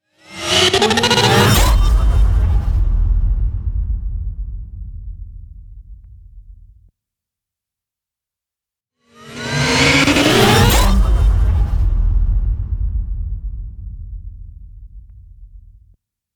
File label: futuristic transform fast; gkrieger